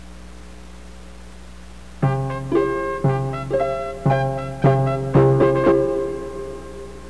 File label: harp bugle